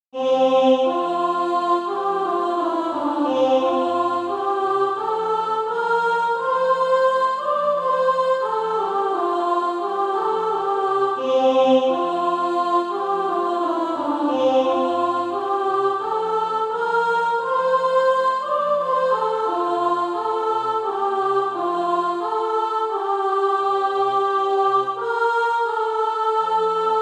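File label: soprano; Faith Hope and Love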